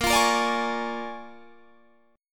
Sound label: A sharp 7th Sharp 9th A sharp down Guitar Standard; A#7#9 strummed; JGuitar